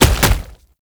Proto Infested Gallop; Enemies; Steps; Warframe; Brachiolyst

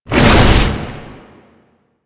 Jump, Foley; Enemies; Warframe; Proto Infested; Babau

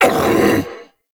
Pain Grunt; Stalker; Warframe; Jade Shadows

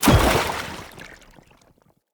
Blunt Heavy Impact Water, Weapon Impacts; Player; Environment

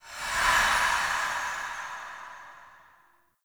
Egg Whoosh; Edens Ultimatum; Ayatan

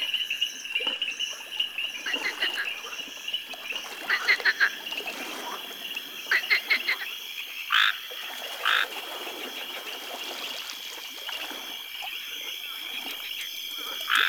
Exterior Lake Critter Loop; Ambience; Props; Warframe; Duviri

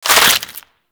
Tendrils Burst; Warframe; Infestation

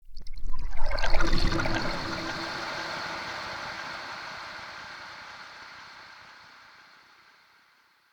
trippy lap, ambience; Soul Plane

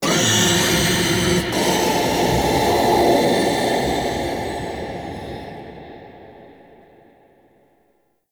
Whispers Large; MITW; Warframe; Ambience; Entrati

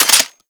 Heavy Shotgun Alt Mech; Warframe; Tenno

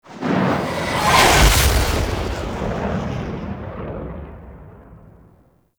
Fly By Quick, Vehicles; Warframe; Liset; Liset Flight; Zariman Liset

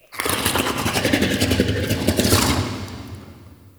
Interior Gurgle Slurps; Ambience; Indoor; Warframe; Infested Eris

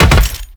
Land Heavy Metal, Footsteps; Enemies; Warframe; Teshin

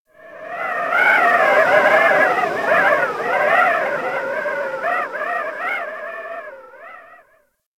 Cold Wind Whistle, Ice; Warframe; Corpus Ice Planet

